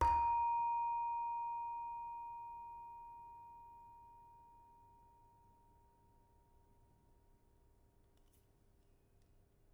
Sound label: Saron soft, gamelan; gravityharps; timbrebox